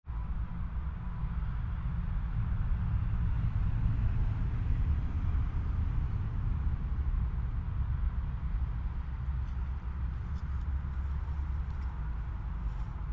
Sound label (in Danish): Tog og motorvejsstøj optaget på midterpladsen i stillebænken